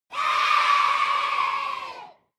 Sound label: Cheer Yay, High Solo